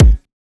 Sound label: Riddim Kick